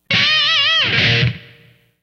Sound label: Scissor Kick; Marty Scissor Kick